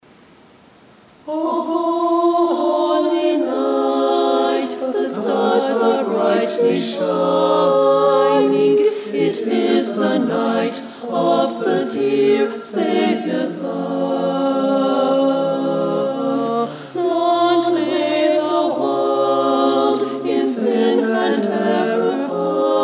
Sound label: O Holy Night SATB